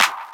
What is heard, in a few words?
909 Clap Wax; Mo' Phatt; Digital Sound Factory Mo' Phatt